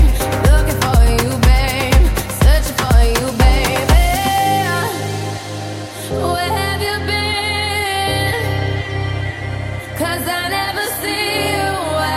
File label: AFRO HOUSE